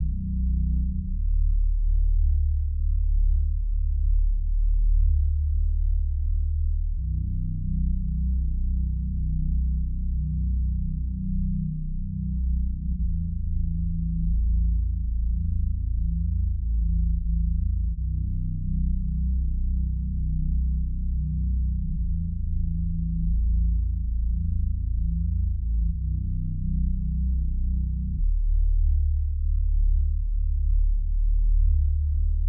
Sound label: bass stretch, Bass Drone; This drone was the initial backdrop for this exercise